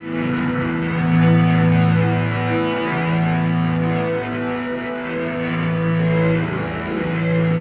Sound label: Long Burn, Elektrik Drone, One-Shot Beds; Sonic Foundry (Sony Creative Software) - Friendly Fire; DroneLoop